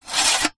industrial tool metal file slide into metal bucket containing other tools; zapsplat